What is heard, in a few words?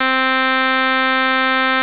reed, SQ80